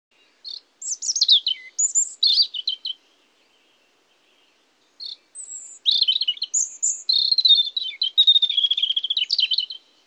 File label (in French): Rougegorge, oiseau; Dès le mois d'avril, le rouge gorge devient bavard. Il chante pour séduire la femelle.